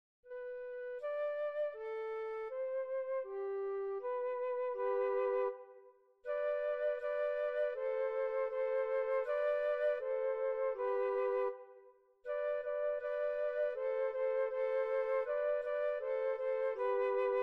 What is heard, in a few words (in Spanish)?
canciones para flauta cancion y variacion; CANCIONES DE FLAUTA PARA INICIACIÓN; 2 voces